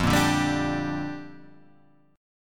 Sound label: G Minor 7th, F